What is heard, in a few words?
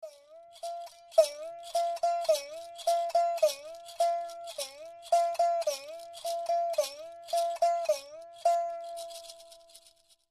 Gopichant